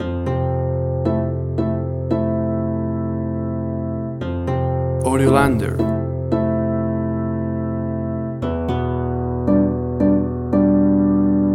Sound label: Being Somewhere Else